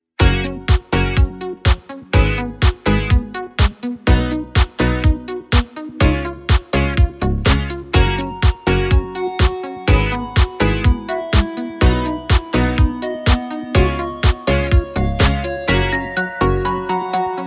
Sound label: minigame; Barbie; gaming music